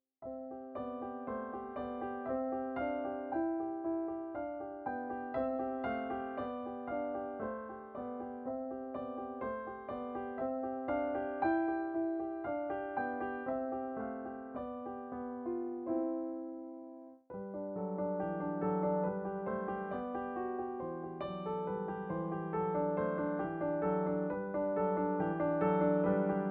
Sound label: schumann op68 humming song